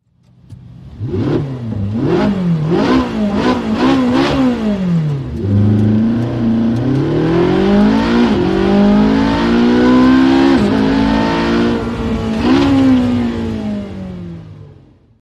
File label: 458 Speciale Standing Start On Board Sound